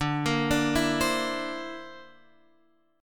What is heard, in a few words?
D 7th Suspended 2nd Sharp 5th, Guitar Open F; x,9,10,9,7,7; arpeggiated; JGuitar